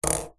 household lightbulb modern plastic base set down table; zapsplat